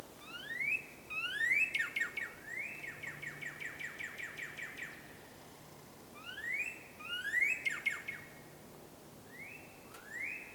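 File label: Northern Cardinal, Falls Lake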